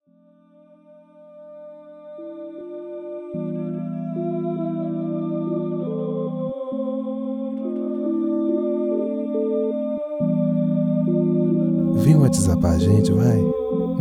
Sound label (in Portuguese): vinheta